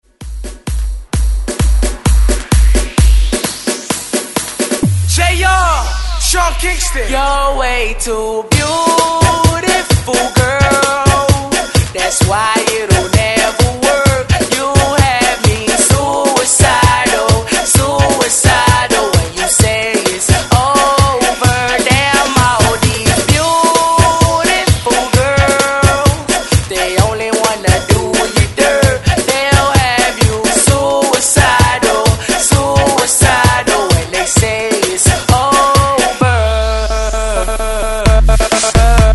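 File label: breakmix 130 bpm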